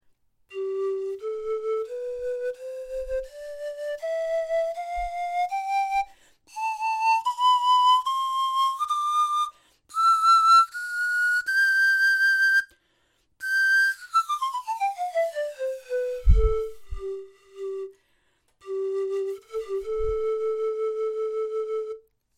pan gross, Panflöte Italien; Panflöten

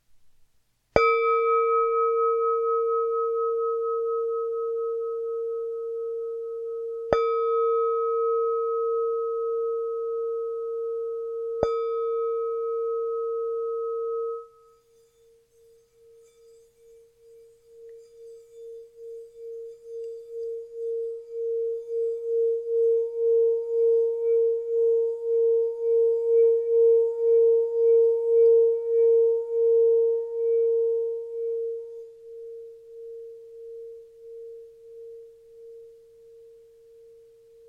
klangschale tibetisch 2180g

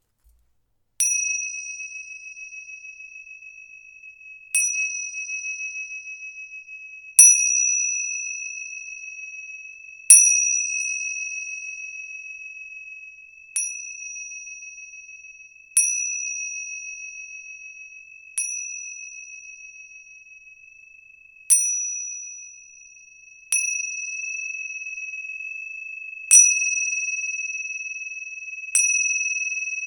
ind zimbeln gross

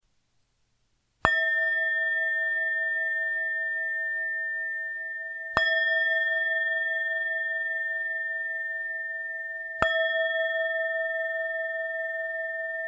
klangschale nada 270g, handgetriebene Klangschale ca. 270 g; Diese kleine Klangschale ist aus 7 verschiedenen Metallen handgetrieben

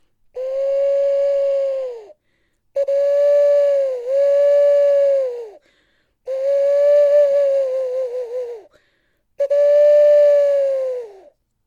eule gross

